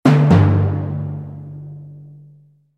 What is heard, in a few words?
drum hit down; gamerip; Heretic Operative